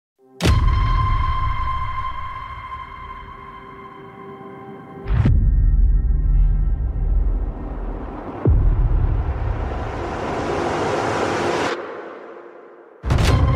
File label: cinematic tension trailer music sound effects